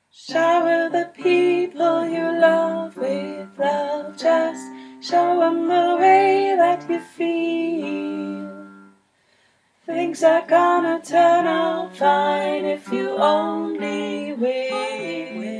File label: Sopran